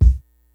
GREASY KIT, DRUMS; Kid Nepro; Elite

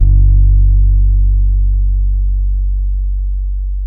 SAMBA, Bass; Spectrasonics; Bass Legends; TYLER SAMBA

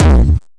Gated Kick; Yamaha PSR E305

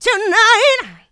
Female Vocals; Houseworx

